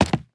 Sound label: hardwood footstep; metrocraft